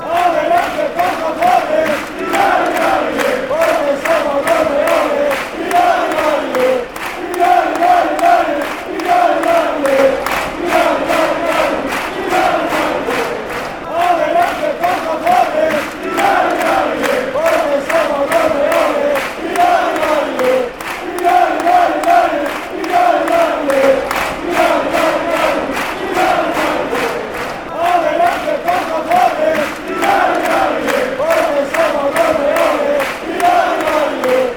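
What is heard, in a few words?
y ale ale eh, football chant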